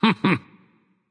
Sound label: "heh heh"; smite; voicepack; poseidon